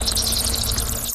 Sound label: Hit; Dracula